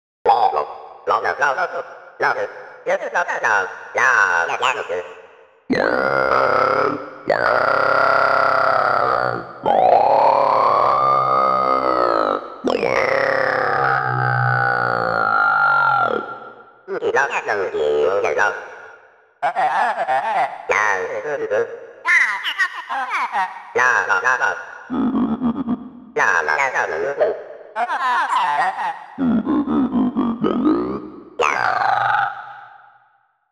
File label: Cypher2 Filter FM vocals